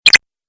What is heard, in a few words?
8 Bit Style Switch; Video Game Sound Effects